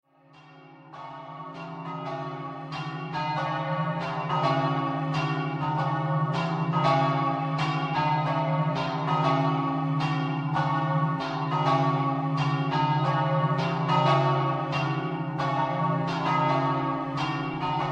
BEIVARS, campane

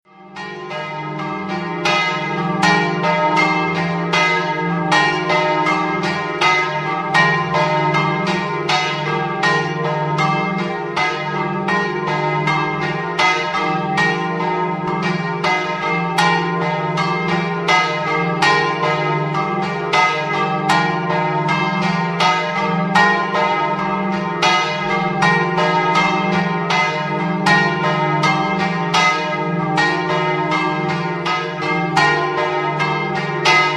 campane; bottenicco

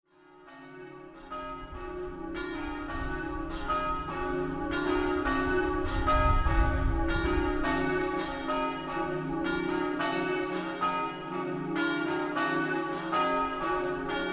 bertiolo, campane

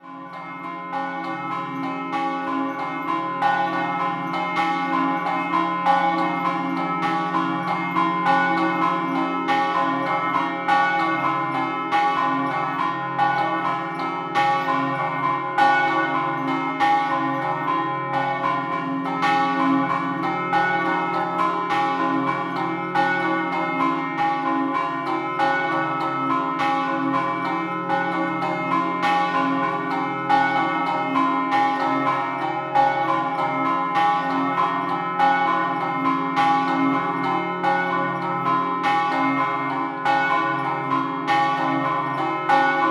Beano Scampanottata

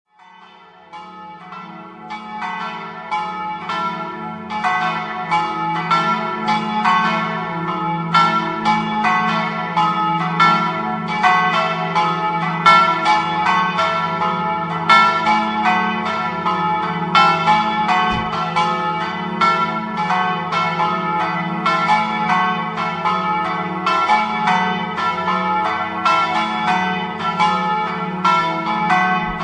adorgnano, campane

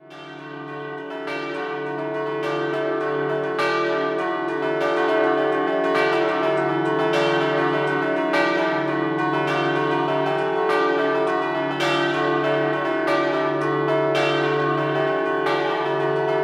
Biauzzo Scampanottata

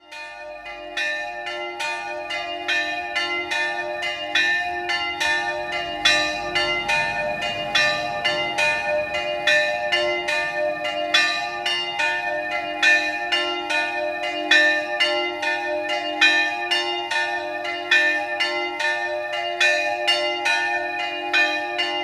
Alnicco, campane

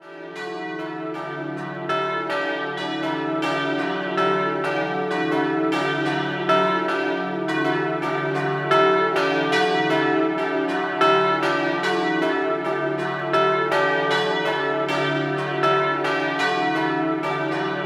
Biauzzo Doppio, campane

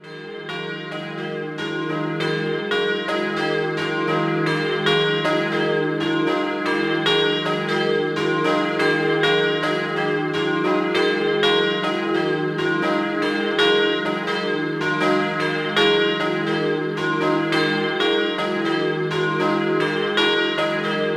Billerio Doppio, campane

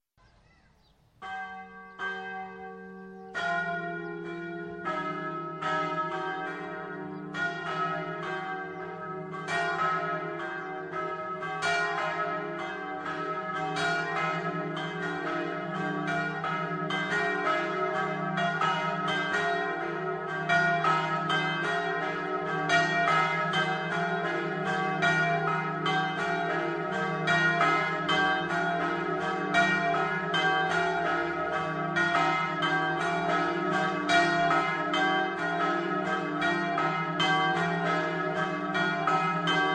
blessano, campane

